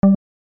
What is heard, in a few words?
pup; oracle